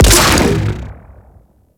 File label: Drop Ship Explosion; Warframe; Ambience; Infested; Golem Trial